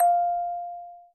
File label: Bell